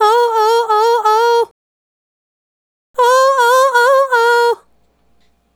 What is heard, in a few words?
Female Solo, Vocal